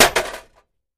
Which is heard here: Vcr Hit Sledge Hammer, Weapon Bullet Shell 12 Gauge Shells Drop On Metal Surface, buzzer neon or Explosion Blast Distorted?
Vcr Hit Sledge Hammer